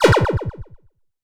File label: Cry